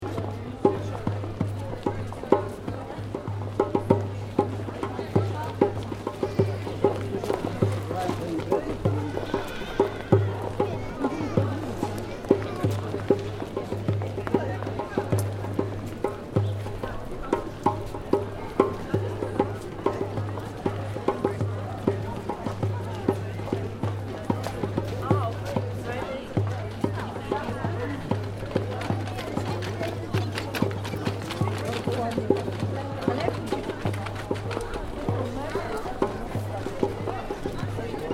Crowded Street Djembe Player, Man playing ethnic drum; Man playing ethnic drum in European city on a busy day in pedestrian area.; Concert Sound Effects